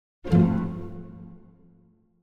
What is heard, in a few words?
Symphonic Hit Short Pizzicato Finale; Hollywood Edge; sound designer's toolkit v2